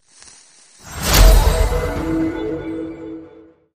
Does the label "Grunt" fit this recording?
No